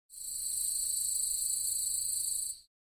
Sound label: Cicada chirping